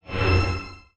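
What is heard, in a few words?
general spell casting; Continentis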